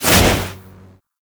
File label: Cloak Pulse End; Warframe; Focus; Operators; Powers